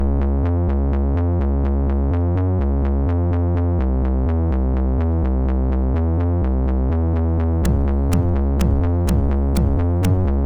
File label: sid MOS6581R2; Noname Demo tune 2